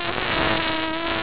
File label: fly